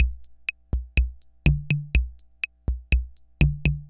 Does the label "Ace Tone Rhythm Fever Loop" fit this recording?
Yes